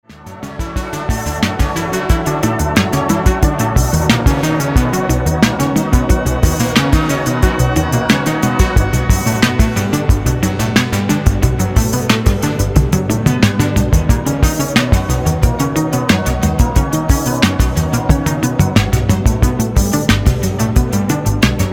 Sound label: Arpeggio